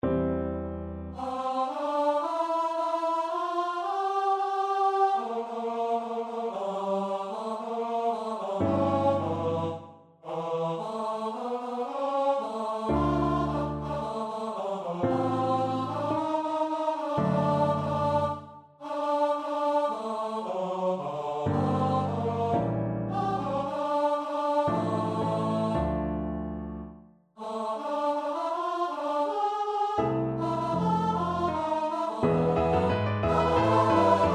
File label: st matthew passion, choir; bach